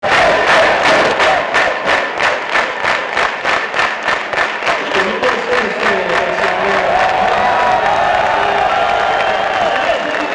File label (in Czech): potlesk